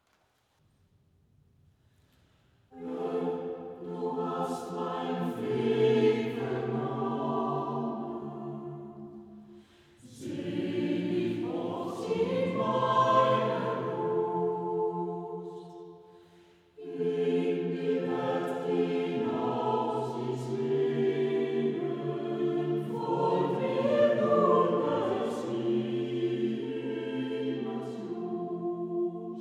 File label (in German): Schlussgesang; Schubert; Deutsche Messe; Vessem St Lambertus